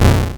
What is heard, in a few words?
Arcade Riffs